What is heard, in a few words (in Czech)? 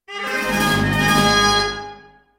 FANFARA HURA; pat & mat game